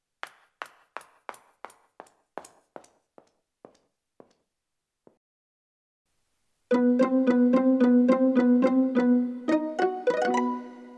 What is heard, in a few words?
Blusa pizzicato